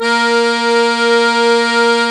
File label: a#4-d5, Accordion